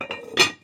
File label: large vessel seal; TFC Quick Pockets